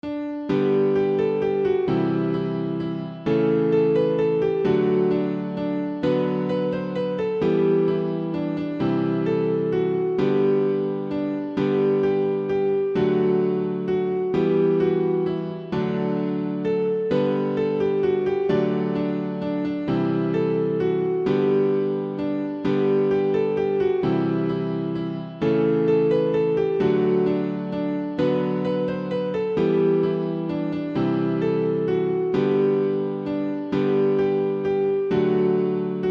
Merry Christmas Alarm Ringtone; ringtones

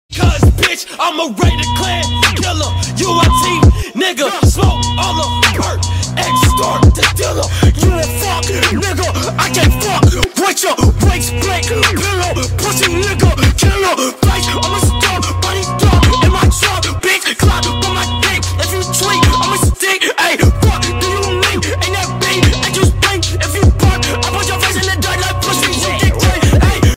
Alarm Ringtone

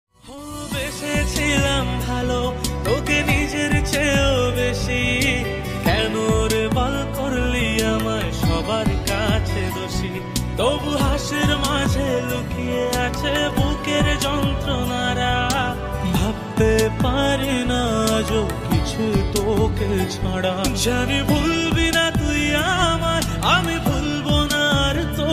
Bengali Ringtone

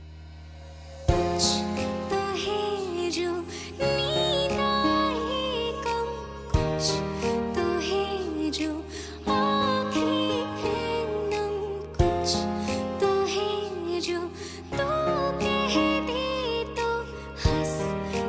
Bollywood Song Ringtone

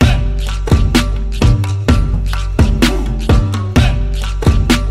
Bengali Love Music Ringtone

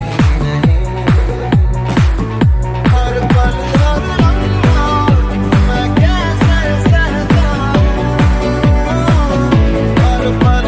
Remix

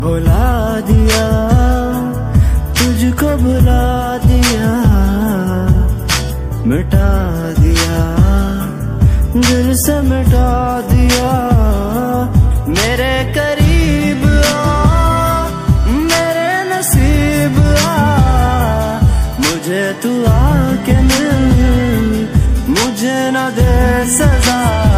Bollywood Song Ringtone; Latest Bollywood Movie Hate Story 4

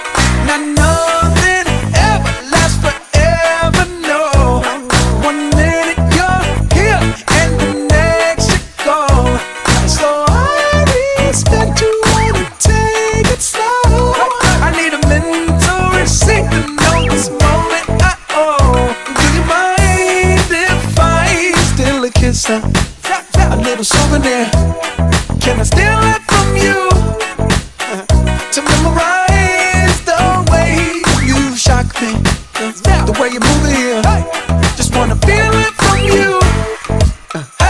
Hollywood Song Ringtone

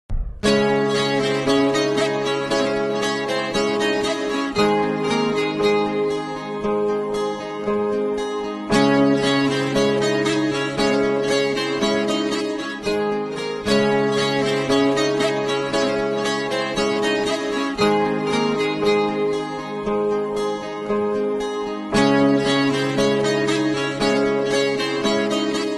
Instrumental Ringtone

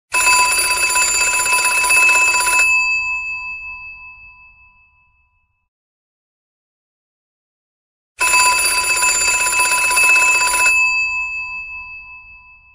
Old Rotary Phone Ringtone; ringtones

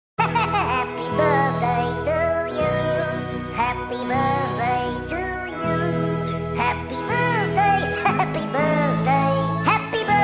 Happy Birthday Ringtone; Minions

